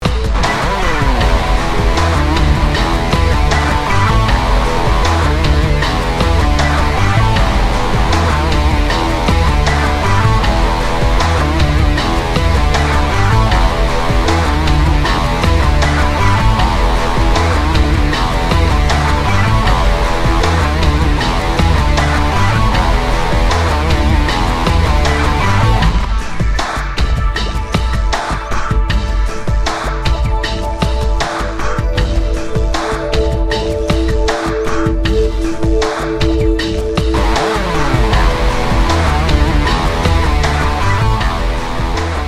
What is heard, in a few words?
Groove